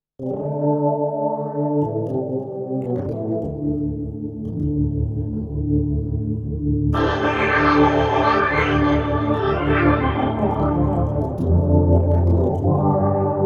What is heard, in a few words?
synth underwater organ